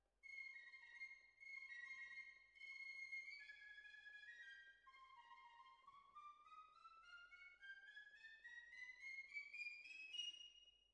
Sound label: Cellos